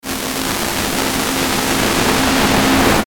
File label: static distort; Static Distortion Fuzz Swell; Production Element Imaging Element Accent Transition; Blastwave